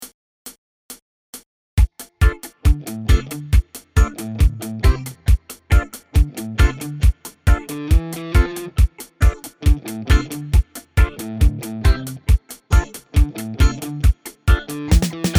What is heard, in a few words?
reggae pattern, bass playalongs